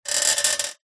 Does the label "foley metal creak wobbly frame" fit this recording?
Yes